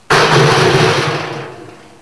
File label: trash Can